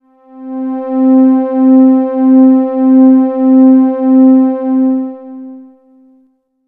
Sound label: String Pad B